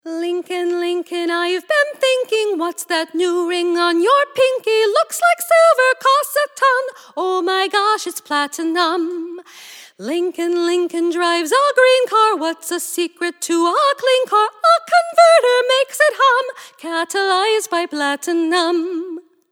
Platinum Element Jingles

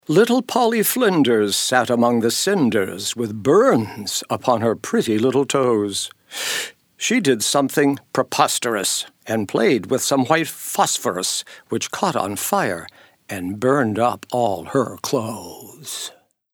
Phosphorus Element Jingles

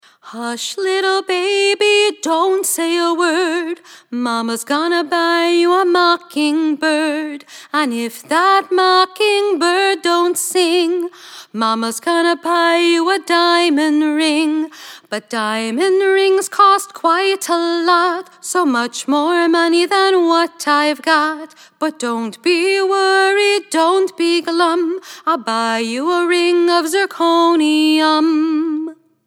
Element Jingles; Zirconium

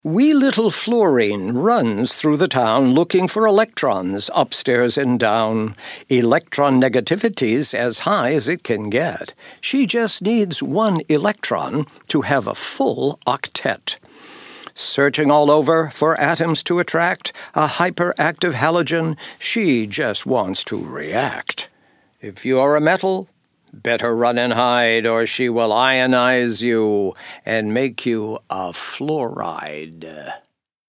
Flourine Element Jingles

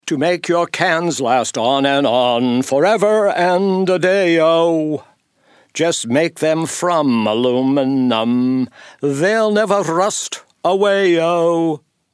Element Jingles; Aluminum

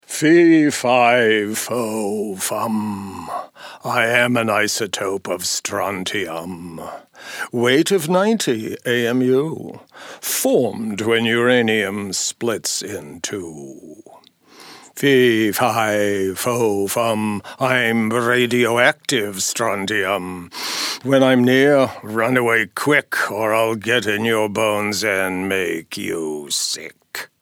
Strontium Lower Pitch Element Jingles

